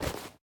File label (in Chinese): 收纳袋插入声